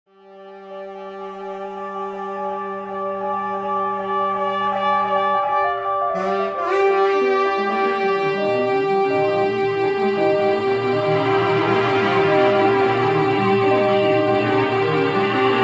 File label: tutti